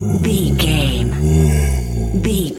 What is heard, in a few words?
bear roar soft